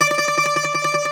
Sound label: Greek Bazouki, Plucked Strings